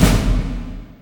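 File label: DEATH, AMBIENT DRUMS; Spectrasonic Distorted Reality 1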